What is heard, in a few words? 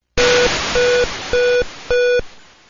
lost ship; Whiteholl; invader